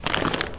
break free spider; slender; aka manto

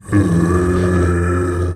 idle, male zombie; nmrih; zerosiki; slender

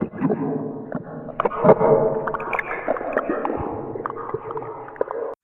portal coming out; slender; aka manto

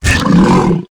thumper death; lethalcompany; zerosiki; slender

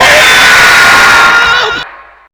jumpscare; weegee; ytp; slender

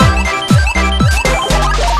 victory; tf2ware ultimate; music game; wii 18volt

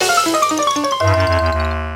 failure; tf2ware ultimate; music game; 3ds ashley

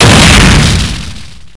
stomp hit sound; chiabihamaru; cv project; invading executioner; zerosiki; slender

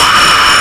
scare; slender; slendytubbie

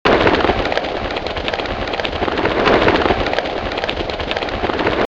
zombi banshee pulling fire

